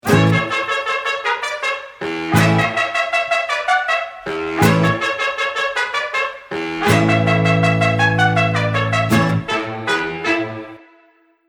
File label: round mvp anthem